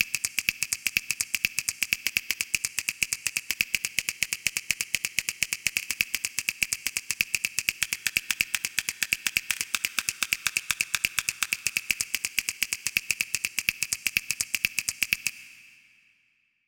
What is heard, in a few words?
fastest snaps